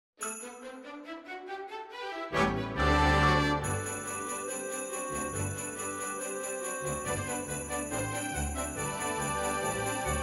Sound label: strings